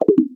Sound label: error